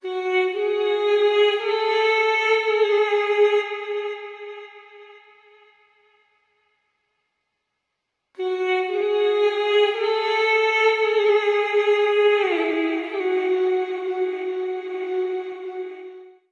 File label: voice, Loops, Sad